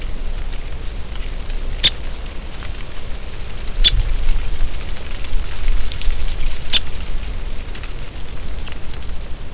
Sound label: downy woodpecker tap call